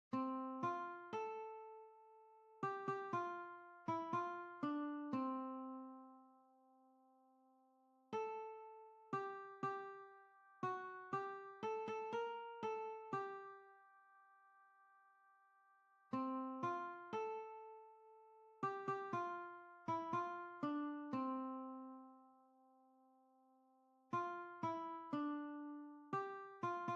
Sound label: Aloha Oe F Lead, mid